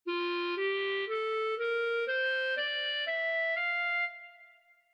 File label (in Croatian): G dur, ljestvice, clarinet